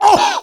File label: Demoman pain sharp